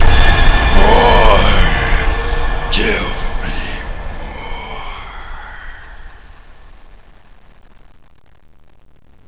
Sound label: zombie win; biohazard; cstrike